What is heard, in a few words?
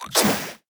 enemy acid basic attack